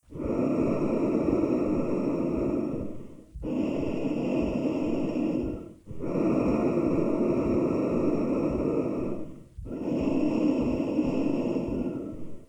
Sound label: breath vacuum; The Swapper